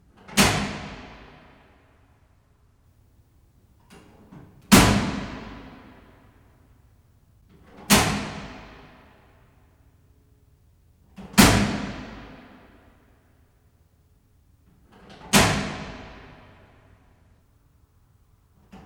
Switch Breaker Heavy

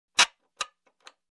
Wood Stapling

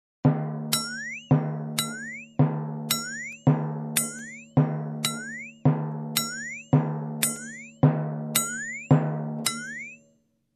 Bass Drum with cartoony toink

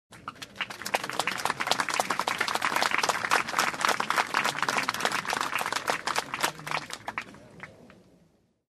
Minimal Applause

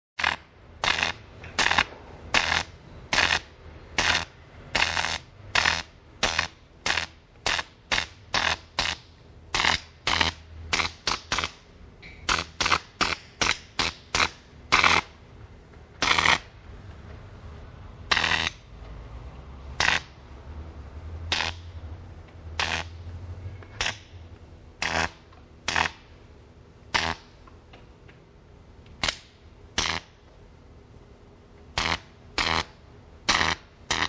Discountinued High Voltage Discharge

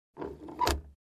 Overhead Locker Close

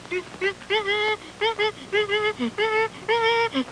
Whinning dog

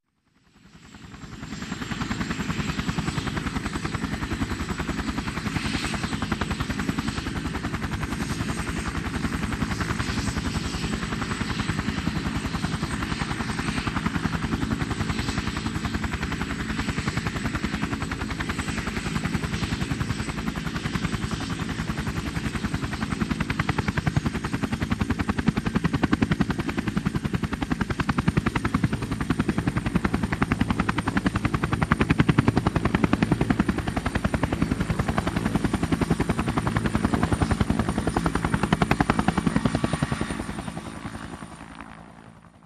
Helicopter on ground idle

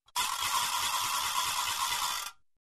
Spinning Sound effect short

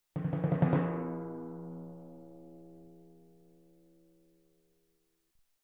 Short Timpani Roll

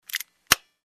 Construction

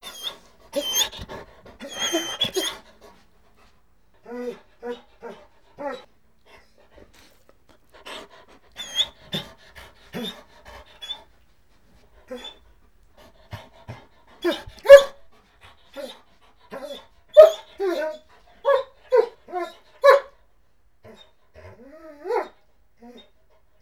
Dog Whining Begging

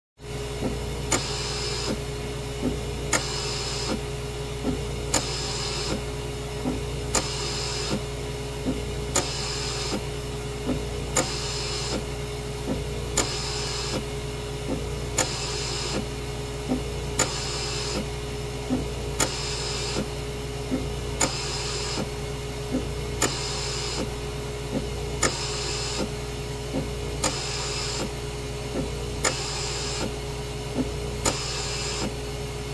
Medical device operates valves

